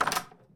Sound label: door loop; Golden Crayon Sounds